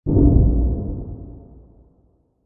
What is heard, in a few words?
boom